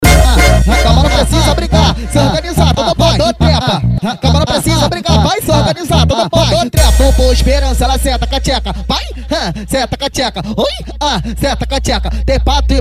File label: funk